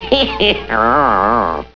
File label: heh heh ooh